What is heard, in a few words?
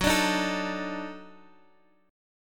Eb Minor Major 7th Flat 5th Gb